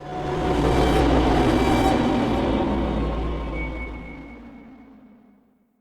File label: horror